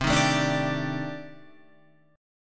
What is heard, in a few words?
C Minor 6th Add 9th C Guitar Open F; Cm6add9 strummed; JGuitar